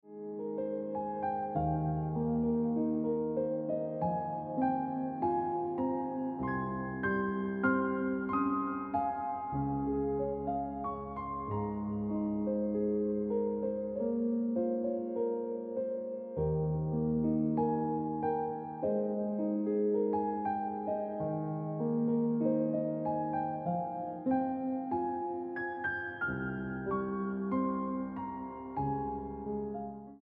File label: Deep In Mind Ringtone Piano Music